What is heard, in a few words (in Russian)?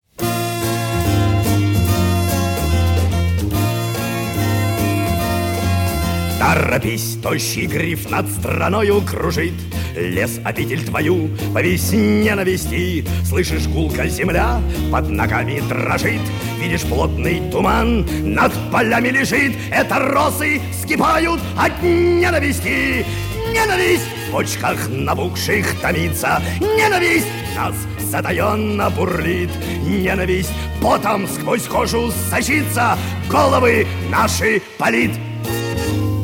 Песня о ненависти; к к-ф ''Стрелы Робин Гуда'', 1975